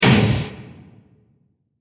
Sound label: Scraped Stab; Blasts; Impacts; Crafted Signals